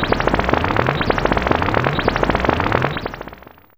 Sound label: Bubbly C4, Fairlight CMI IIe; Effects3